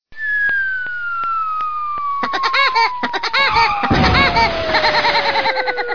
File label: fail